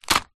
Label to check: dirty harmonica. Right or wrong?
Wrong